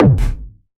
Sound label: Hard Kick